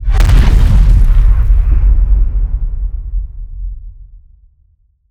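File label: explosion long deep; weapons; starruler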